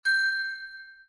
Cake Upgrade

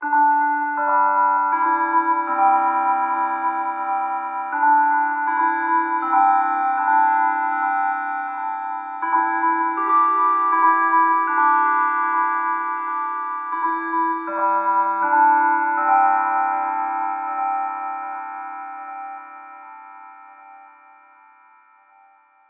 Bell

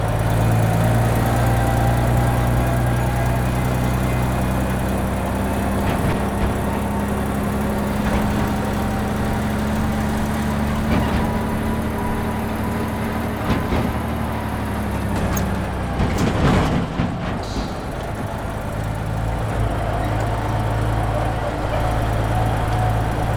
vehicle dumptruck drive; teardown; ps5 windows xbox series xs

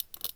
spark

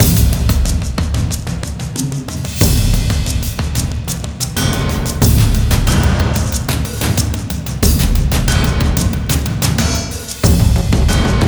Level Music (Low Intensity); The Expendabros

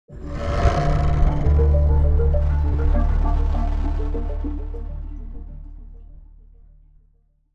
stinger; Wasteland 2

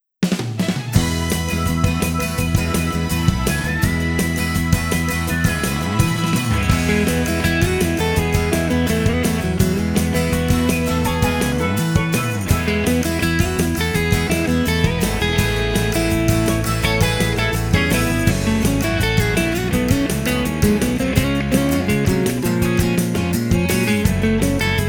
Harbor; Toad Harbor

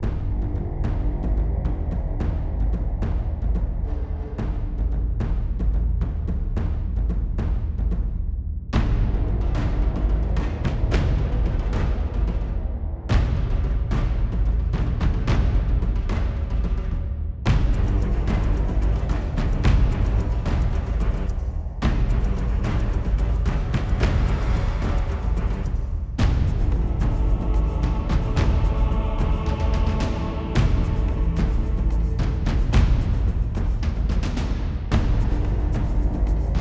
Battle Fortress; Eon Altar

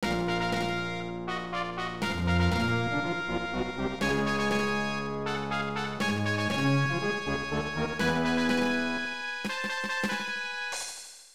Fanfare; Turf Memories; snes

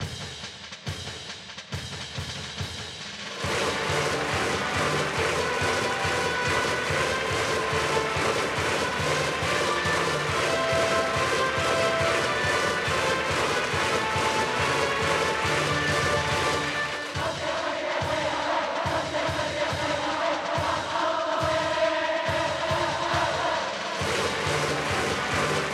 Music Ouen Brassband; ebaseball powerful pro yakyuu 2020 ps4 switch gamerip 2020

